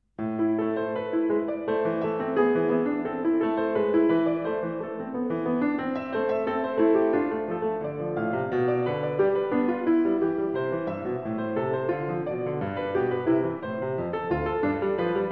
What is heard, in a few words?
Bach Invention 13 in A Minor BWV 784